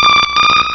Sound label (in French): Cri